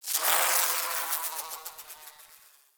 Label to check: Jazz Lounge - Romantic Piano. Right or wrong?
Wrong